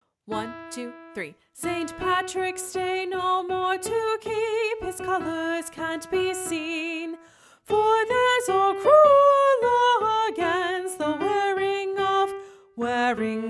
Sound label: Wearing of the Green - Alto 2